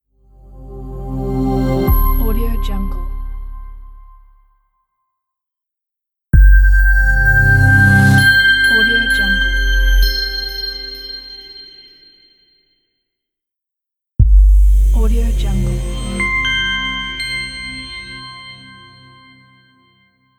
soft elegant logo pack

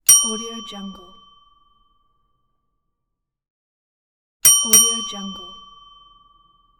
hotel bell

